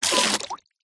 leisure toy water gun squirt water into swimming pool